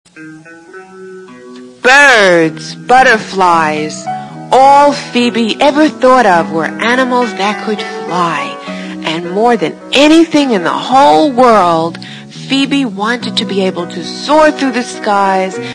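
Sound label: background music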